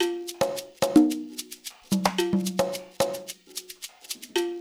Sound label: percussion loop